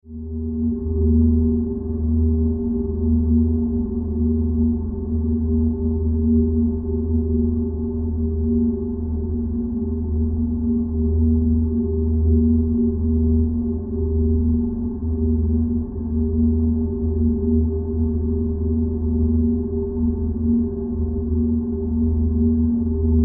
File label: Science Fiction Drone Hollow Vox; Sci-Fi